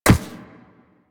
Walk Ice, Footsteps; Warframe; Operator

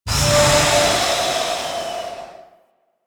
Stream Blast A; Warframe; Ambience; Grineer; Grn Hanger

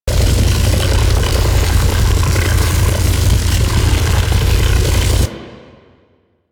Ice Beam Loop; Enemies; Abilities; Warframe; Corpus; Arachnoid Camper Terra

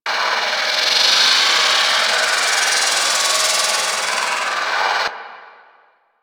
Smite Projectile Loop; Warframe; Warframes; Paladin

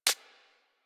Bird Of Prey Feed Ground; Warframe

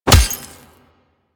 Melee Fist Impact Robotic Flesh

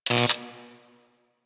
Quill Dart Gun Mode Switch Electricity, Weapons; Warframe

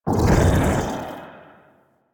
Operator Wraith Void Enter; Warframe; Focus; Powers; Wraith Movement

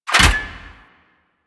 Rescue Tube Stop; Ambience; Warframe; Corpus Ship Remaster

